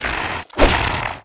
ice scorp lug attack, monster

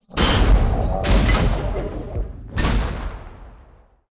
dead, monster

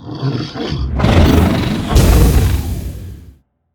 seed frog; monster

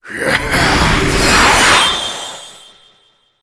kentauros, monster

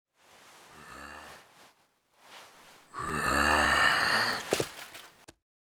kentauros idle, monster

